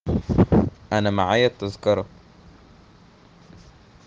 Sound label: airport